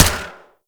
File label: Fire; Warframe; Weapons; Single Viper; Wraith